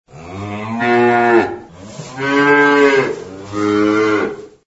mychanie dvuh korov v stoyle

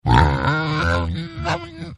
nedovolnyy zvuk svini

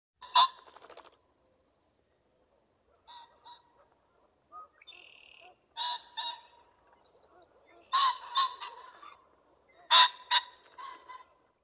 Fazan

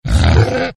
korotkiy vizg vzrosloy svini

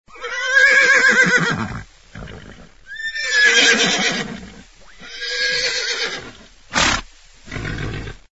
rzhanie loshadi i v konce fyrkane

